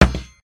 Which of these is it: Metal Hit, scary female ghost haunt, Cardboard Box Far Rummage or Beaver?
Metal Hit